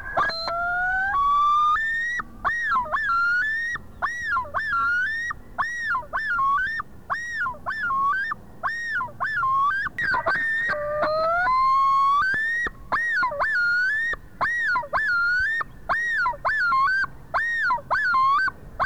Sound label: Loon; Piper; Langley